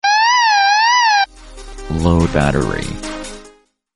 low battery notification tone

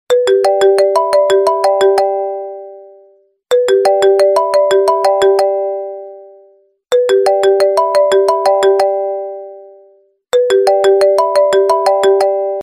apple i phone 4g ringtone

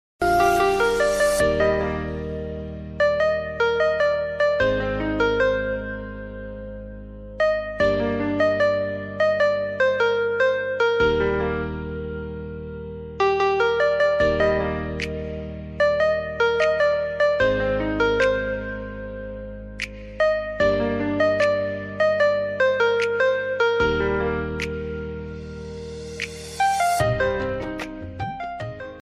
bollywood instrumental ringtone

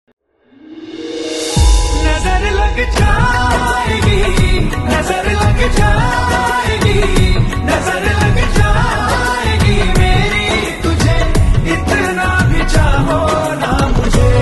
romantic song tone ringtone; samsung ringtones

